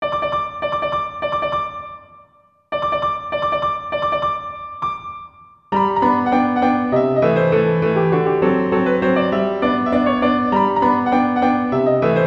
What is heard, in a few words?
Magic Bell Polka; Musical Magic - Magicians' Sheet Music